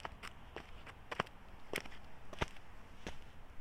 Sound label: Walking On Concrete